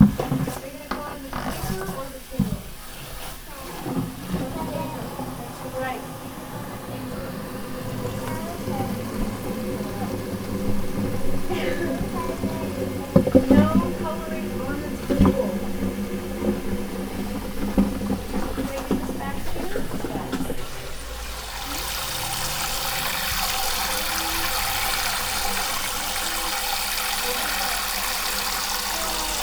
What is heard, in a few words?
Building Fill Bucket